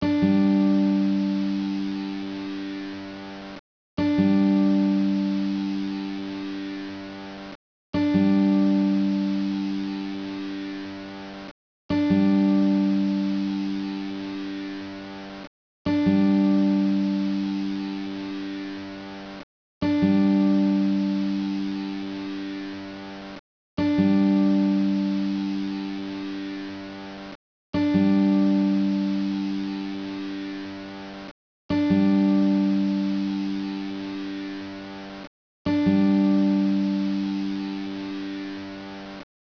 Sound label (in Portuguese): guitarra Loop